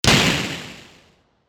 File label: gunshot; 武器効果音